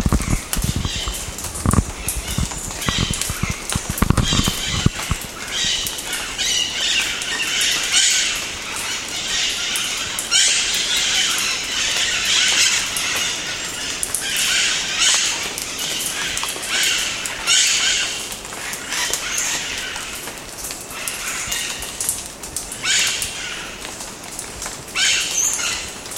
bird

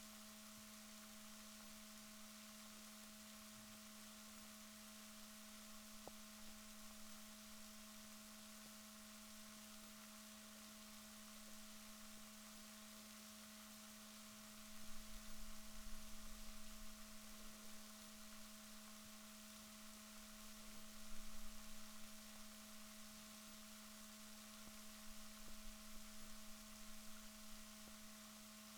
rorquals positif, Balaenoptera Physalus Chile

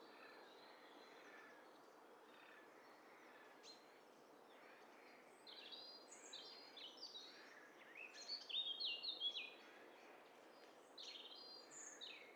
STEREO BIRD COCKTAIL PARTY

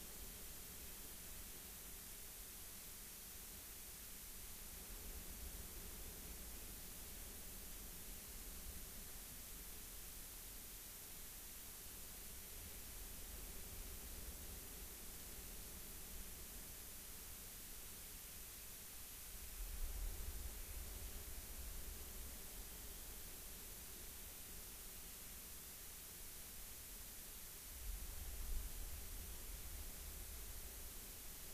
rayol farm; SEAMED

